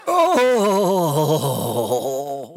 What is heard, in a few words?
groan